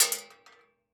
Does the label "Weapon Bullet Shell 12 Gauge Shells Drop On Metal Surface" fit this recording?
Yes